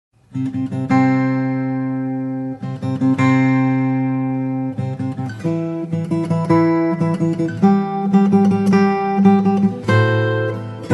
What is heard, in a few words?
Wedding Recessional